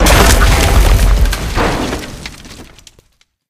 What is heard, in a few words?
sled thru fence impact; ambient; source engine; ep2; outland